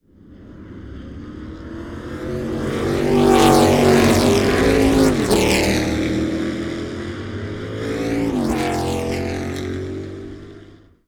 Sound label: Can Am pack, engine